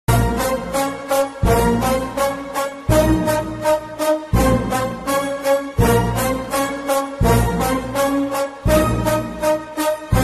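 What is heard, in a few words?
ultimate mass background music; race gurram; allu arjun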